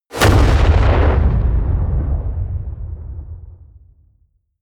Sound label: detonation